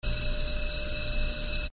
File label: alarm; Weatherscan